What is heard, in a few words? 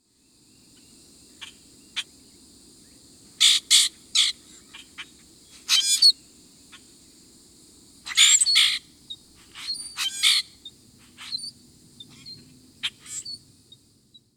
Common Grackle Calls and song